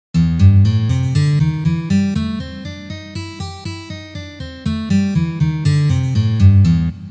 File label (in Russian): Blyuzovye gammy Lya minor